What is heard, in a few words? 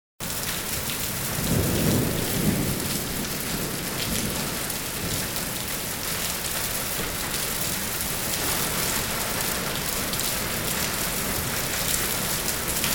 rainy day; Rainy day, Paris.